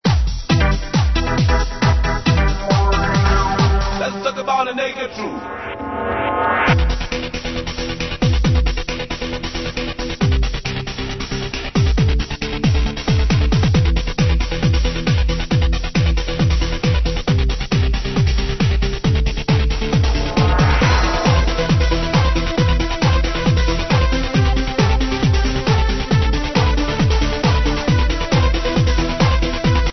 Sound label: trance